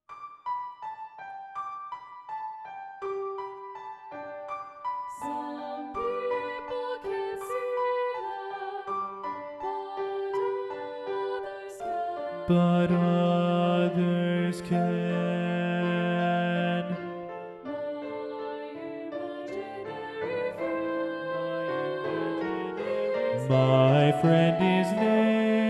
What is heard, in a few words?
SATB Bass 2 Predominant